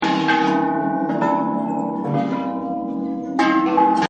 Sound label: Buddhist Bell Amb Loop; Bells; Musical; Partners In Rhyme